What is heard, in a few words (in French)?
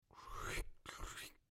sachet plastique; mouth-fishing